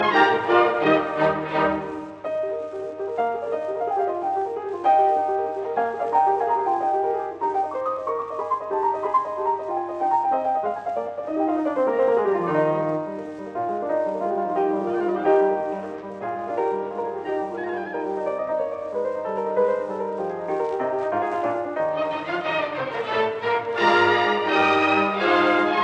Concerto for 2 Pianos in E flat major Rondo Allegro; Wilhelm Furtwangler